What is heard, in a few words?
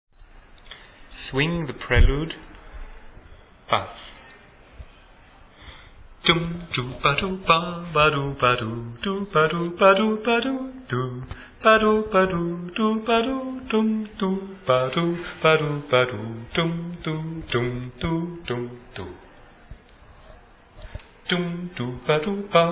Bass